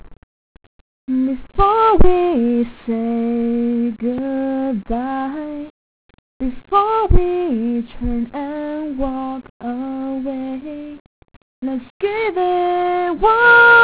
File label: karaoke